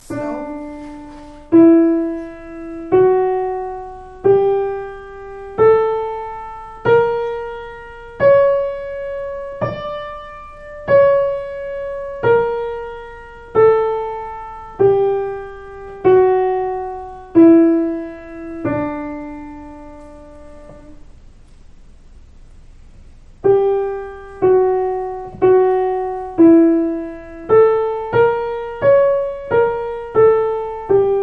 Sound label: piano; Hopkele (piano); Oefenen; Jiddish ensemble TOCH